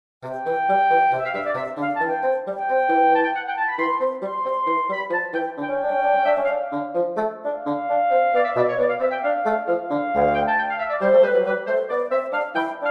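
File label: Duo clarinet bassoon; Beethoven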